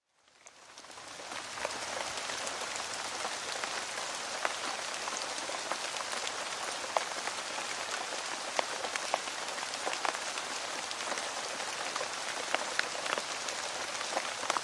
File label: Rain